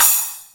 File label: splash, Roland R-8